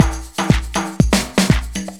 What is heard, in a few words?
caracas, Deep House 120bpm